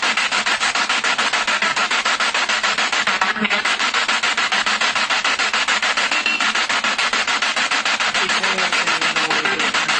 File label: Kitchen Spirit Box; private residence freedom road apartment investigation